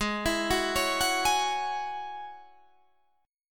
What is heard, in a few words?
Ab 7th Suspended 4th Sharp 5th Ab